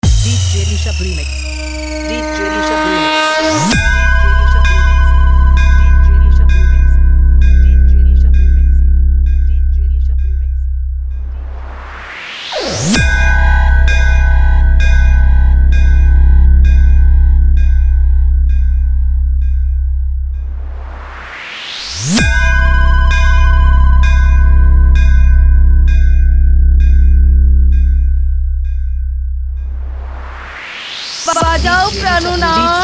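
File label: speaker killer vibration test beat, competition mix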